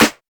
airy steel snare drum sample a key, Royality free snare one shot tuned to the A note